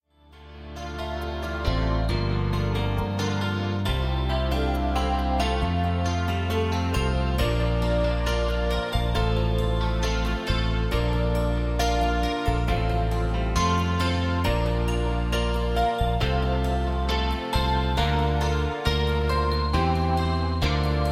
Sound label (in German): playback ohne floete